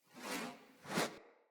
Great Sword Whoosh Accent Air Heavy; Weapons; Player; Mendicant Great Sword

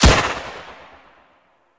Fairy Weapon Shot

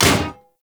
Melee Hammer Impact Generic; Hammers

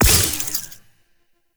Corruption Pickup Small; Warframe; Zariman; Missions

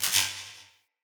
Shock Projectile Zap

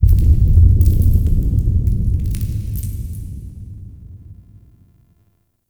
Interior Cave Deep Boom; Ambience; Warframe; Duviri

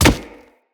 Step Default, Footsteps; Infested; Charger

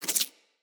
Root Spider Web Shot Proj Whiz; Animals; Spiders; Abilities

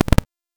Short Sword Medium Parry Impact

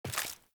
Steps; Arthur; Warframe; Ambience; Nineteen Ninety Nine

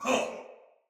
Pain Soldier Male; Battle; Barks; Dialog; Sinecure Soldier Male B

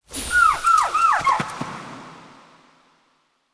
Fawn Mote; Animal Motes

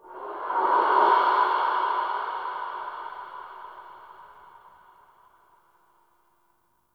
Texture Interior Small One; Ambience; Warframe; Sentient Lair

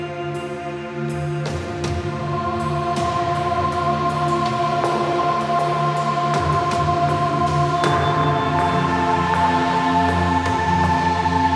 Launch Cinematic Music; Cinematics

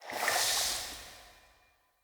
UI Colour Swap; Verminia

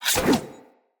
Dagger Throw Whoosh; Weapons; Enemy; Daggers; Sinecure Dagger

